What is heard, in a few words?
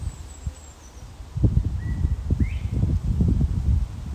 bird